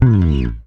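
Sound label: SB SLIDE, SPECTOR BASS; Northstar - New Gold VOL-1; SPEC.FUNKBAS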